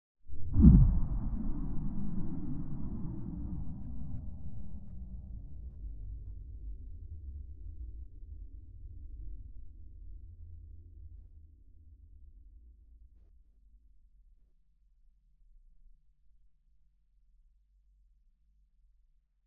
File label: crunch woomph